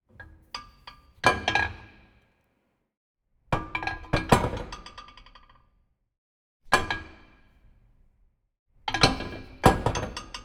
Gym, Foley, Barbell weight 10kg steel, add and remove from bar; Borg Sound; Gym weights